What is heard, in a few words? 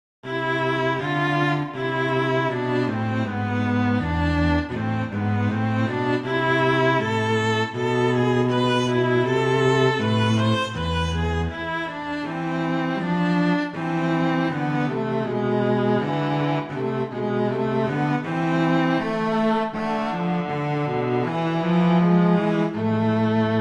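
Romanza, Andante, viola cello; Mazas; Duets; Duet 9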